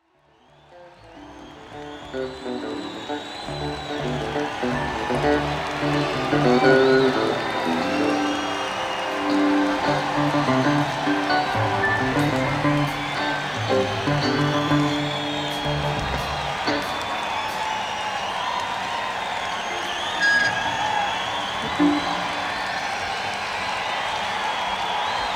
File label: Crowd Tuning; senn me80